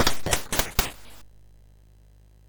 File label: sid MOS6581R2; California Games